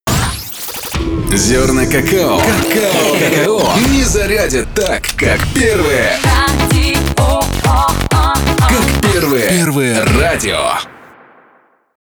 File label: radio jingle; ru-zerna kakao